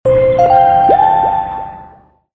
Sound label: tung tung wyung